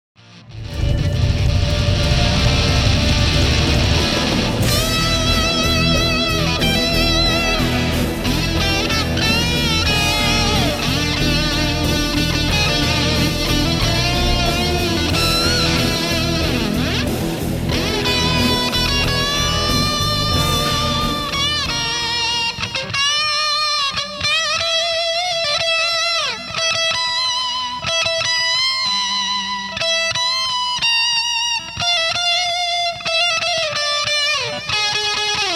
Fuzz Face

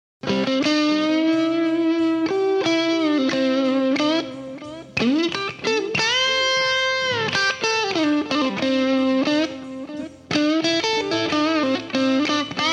Mid gain, High Gain, Comparison; Earth Drive; EMG

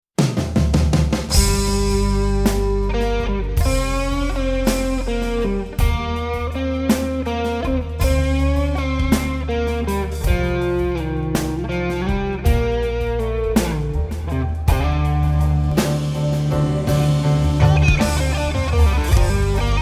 Solo, Strat, Rat, Twin Reverb; Twin Reverb RI. This is a Strat with EMG-SA neck pickup, Boss CS-3 Compressor, and a ProCo Rat.; CE-3

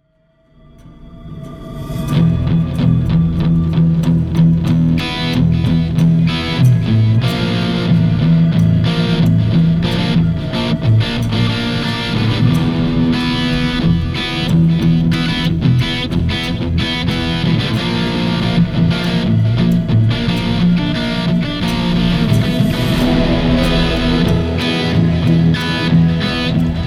Solo Delay; Starfinder

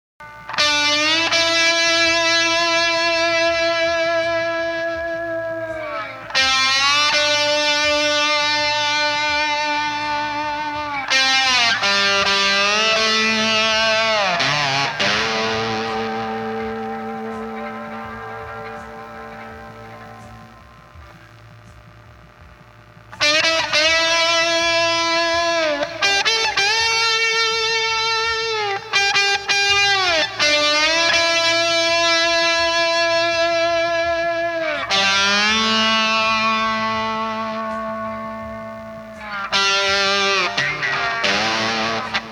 Castledine 18v Power Boost sample

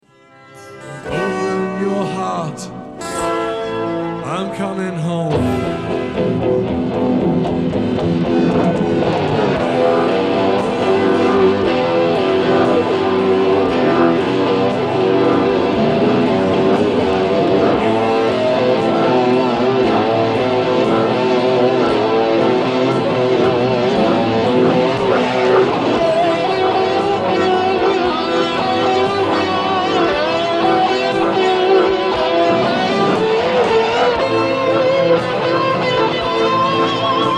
Isolated Guitar; Torino 1994

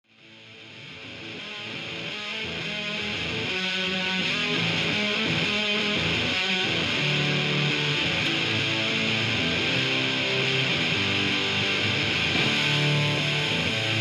Guitar Extract

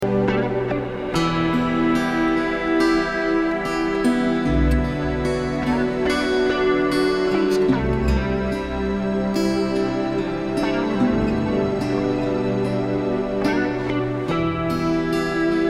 modulated solo guitar

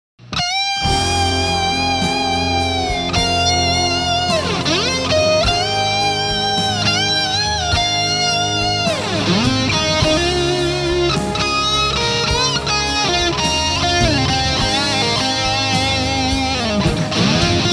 solo EMG Strat Tube Driver

